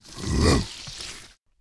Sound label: zombie attack